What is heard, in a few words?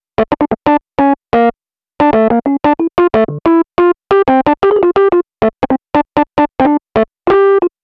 Rhodes Solo, Cinematic Funk